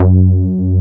SLAM F#2; Best Service Alpha Dance vol.2; SLAM SEQ.1

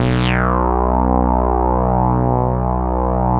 saw res dry, synth; Keith Emerson Signature Series

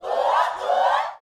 SHOUTS, Gospel

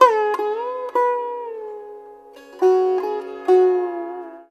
SITAR; Spectrasonics; Heart of Asia

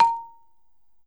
BALAFON, African Melodic

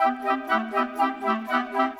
Winds, Orchestral

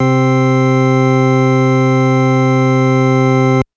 Organ Synth c3

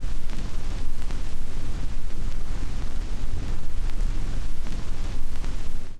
Tape Noise; noise hiss crackle

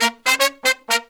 Pop & Funk Brass, 120

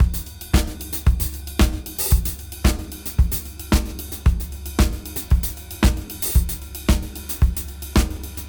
113 BPM; AMG; Keith LeBlanc Phat Butt Jams

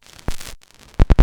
vinyl; Masterbits; Vinyl Attack

